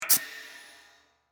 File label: Air Insert Valve, Industry, Air, Insert, Air, Compressor, Valve